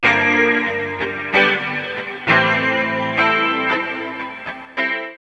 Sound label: GUIT; East West - Phat & Phunky